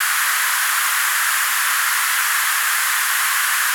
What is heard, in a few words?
white noise; pa 700